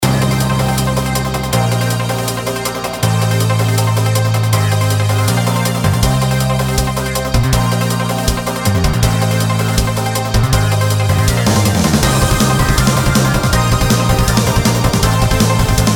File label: bpm160 inst